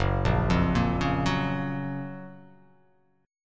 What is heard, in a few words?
F sharp Major 7th; chordshape; down